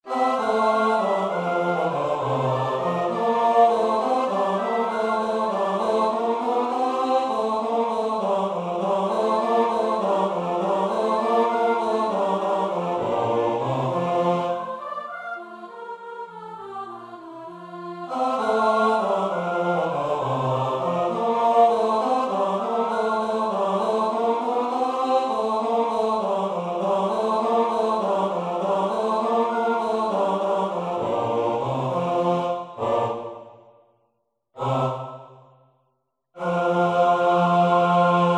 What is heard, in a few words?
Basses